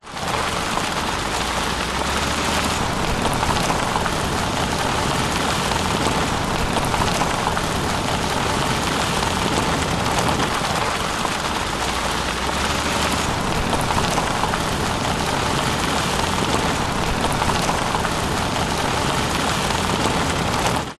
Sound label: vehicles car hyundai trajet drive dirt road track external wheels stones pov; 2006